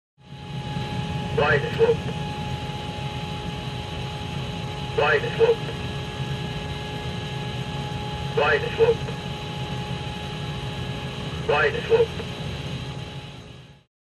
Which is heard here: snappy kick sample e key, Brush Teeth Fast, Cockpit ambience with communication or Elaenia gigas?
Cockpit ambience with communication